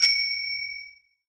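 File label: BELL HIT, bicycle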